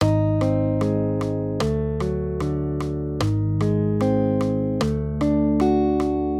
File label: maj triads; Ab-D; accompaniment